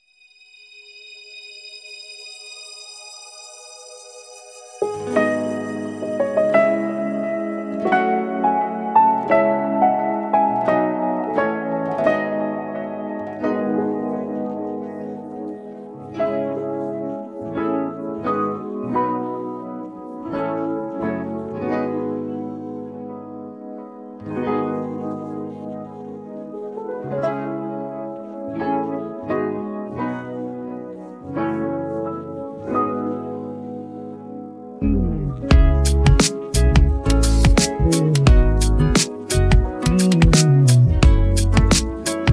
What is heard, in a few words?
instrumental; persian